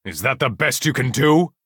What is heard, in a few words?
attack